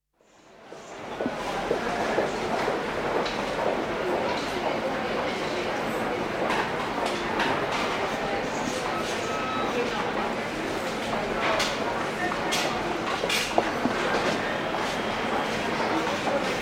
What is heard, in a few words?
France, Department store very busy atmosphere with electronic tills, footsteps and voices; Department store Printemps very busy atmosphere with electronic tills, footsteps and voices; Building Sound Effects; BBC